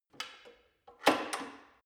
gym bicycle saddle adjust lock soft, fitnessstudio